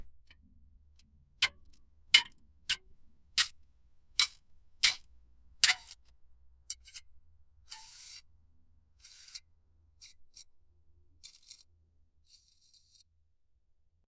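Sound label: create a very quiet subtle, time capsule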